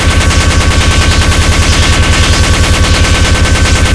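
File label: minigun shoot crit, weapons; tf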